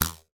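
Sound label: cartoon ping twang; zapsplat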